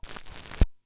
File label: vinyl; Masterbits; Vinyl Attack